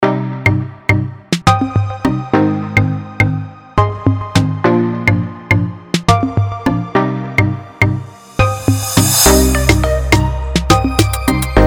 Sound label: Dancehall